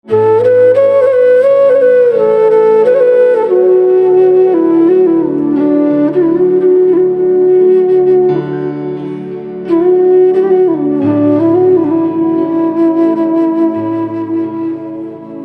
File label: Flute